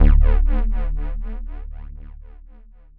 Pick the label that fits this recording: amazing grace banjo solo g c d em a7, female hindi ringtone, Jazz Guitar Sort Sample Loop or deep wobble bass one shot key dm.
deep wobble bass one shot key dm